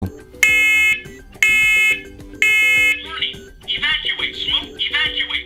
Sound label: child awakening combo smoke and co alarm with voice; first alert; sco7cn-c